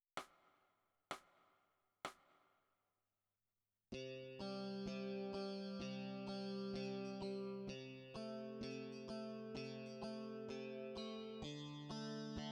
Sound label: E Guitar